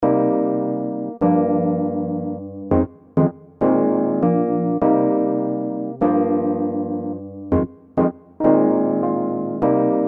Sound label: keyboard